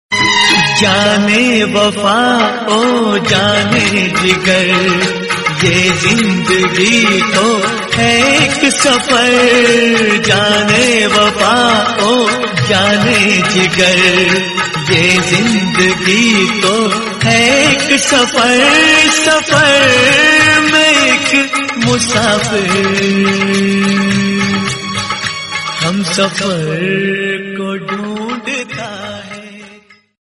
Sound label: Hindi Old Song; ringtone